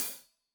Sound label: Hat Loose, Hi-Hat, Cymbals Stereo